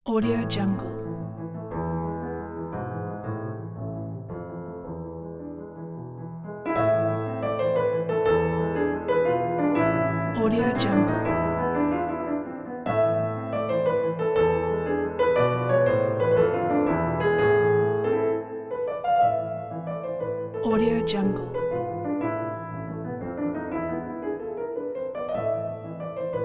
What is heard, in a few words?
classical style passionate piano piece